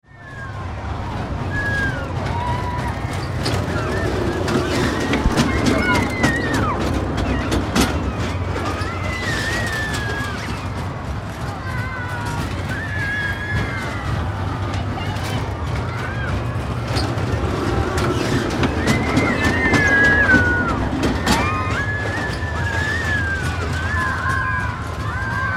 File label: Carnival Ride Small Rollercoaster Kids Screams; Amusement Park; Ambience; Amusement Parks; Blastwave